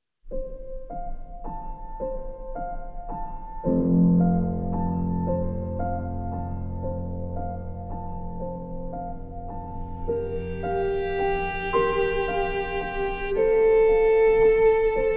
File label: piano; Akira Eguchi